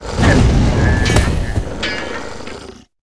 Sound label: bee death, monster